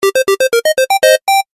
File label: Chip Tunes Sms